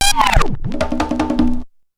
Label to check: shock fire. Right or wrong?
Wrong